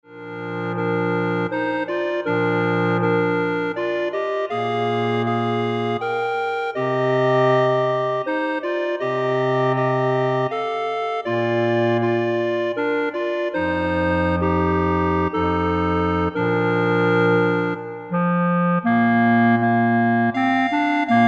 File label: Clarinet Ensemble